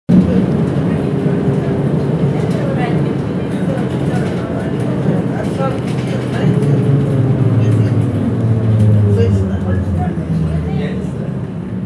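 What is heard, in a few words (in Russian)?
звук автобуса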